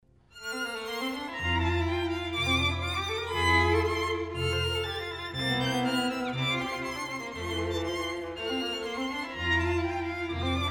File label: Divertimento in Bb Variation II; Mozart; Divertimenti; St Martin in the Field's Chamber Ensemble